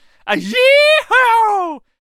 Lasso Attack Vocals

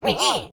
Banshee Attack Vocals

